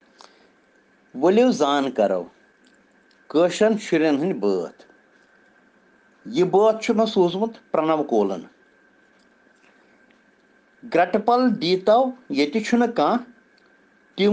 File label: Children Rhyms in Kashmiri